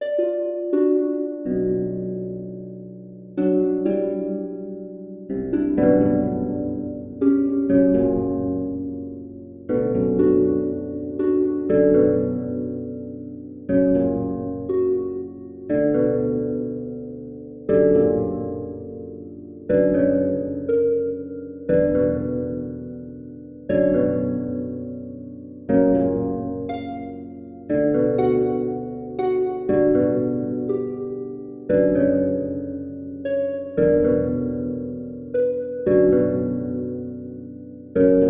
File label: harp; toonschets